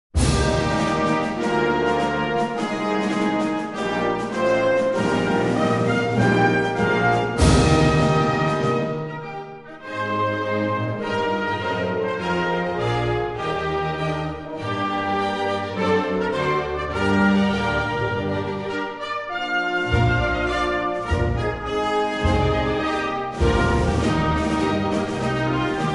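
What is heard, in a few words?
anthem, Portugal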